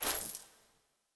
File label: Ur Coin Toss; UI; Minigames